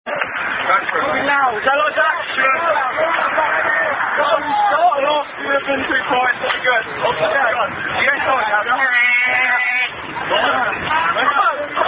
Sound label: rugby song; Northfields